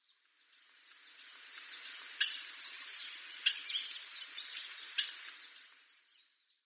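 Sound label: Chinese grosbeak, sakata; koe; koikaru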